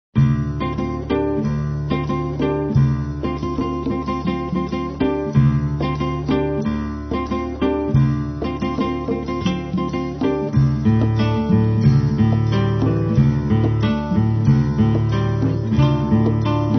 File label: Berimbau